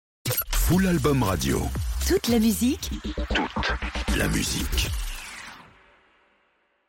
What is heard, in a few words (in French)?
Radio Sweep Toute la musique